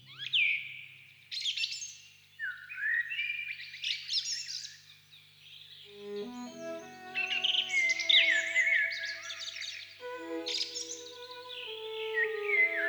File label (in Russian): drozdi na zakate